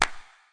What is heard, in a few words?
real verb real; clap; Claps